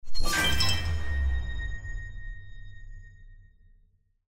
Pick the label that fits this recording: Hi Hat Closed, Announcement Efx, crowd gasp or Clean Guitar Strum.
Announcement Efx